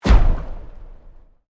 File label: Sweep Mechanical Fast